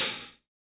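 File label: Hip-Hop Kit hi-hat; Roland Juno-D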